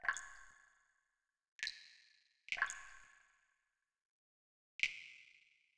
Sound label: dripping; rtcw